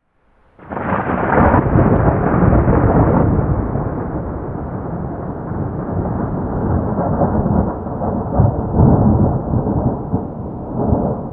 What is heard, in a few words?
lightning sound